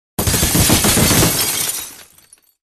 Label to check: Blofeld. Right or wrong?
Wrong